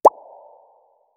sweet bubble pop; cute

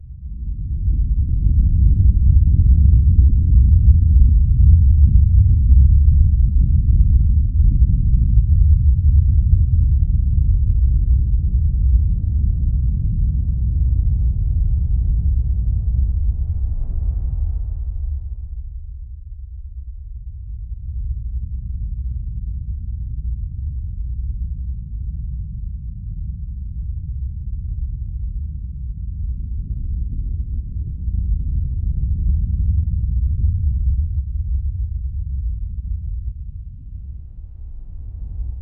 cinematic sfx scorched, lava

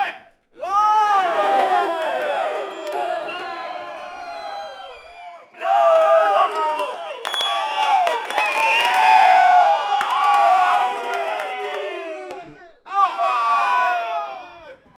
small group of 5 10 peopl, event

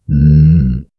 a low tormented moan, humanoid

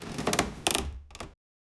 fast creaking opening of

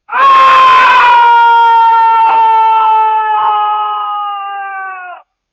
army war cry

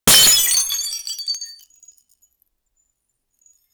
glass breaking, breakage

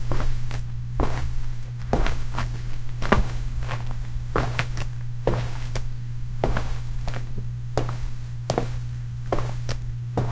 sound of a woman walking, footsounds

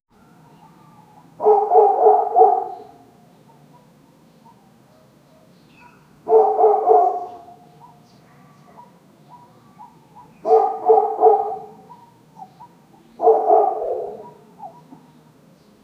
the sound of street for, city life

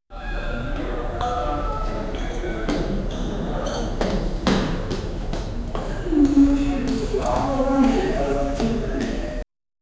indian hostel corridor at; indoors

